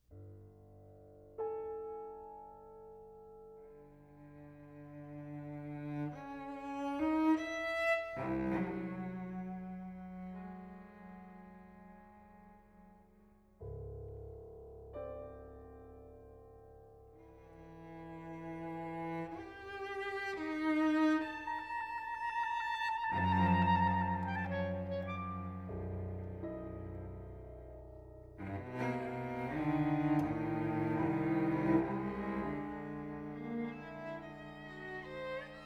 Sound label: Quintet Good Times Suspension